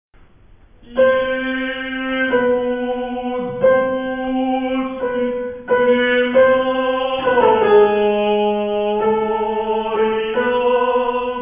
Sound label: Tenors; Jesus dulcis